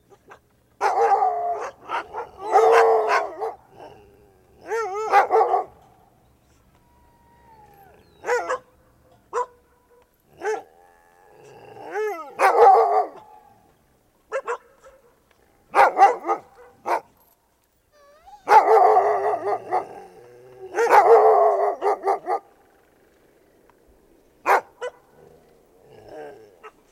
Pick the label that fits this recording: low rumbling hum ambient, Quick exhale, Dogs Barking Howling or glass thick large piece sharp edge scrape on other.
Dogs Barking Howling